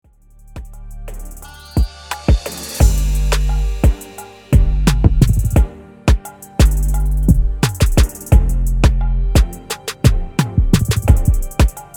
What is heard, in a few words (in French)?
Beat Avant; Jessie Records